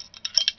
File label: Henchman Belt Jingle; Playable Tutorial Level; TR2